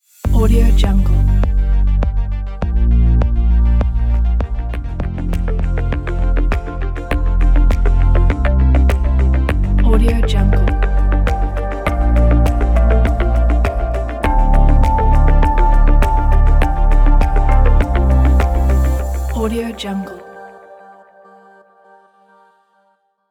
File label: tech ident